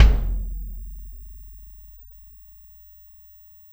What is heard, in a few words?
Kick Shard, Processed Drumkits